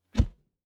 Punch Swoosh Thud; Hit Whooshes

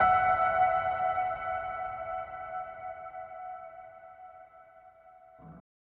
Piano Reverb High Ultra

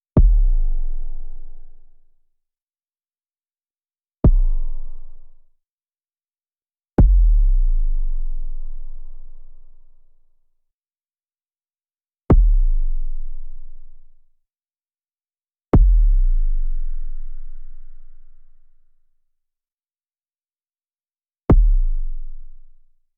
Impact Fireworks

